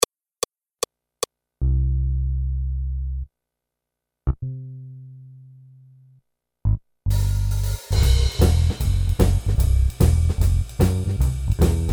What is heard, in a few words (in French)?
Just a little blues Accompagnement seul, piano; Jazz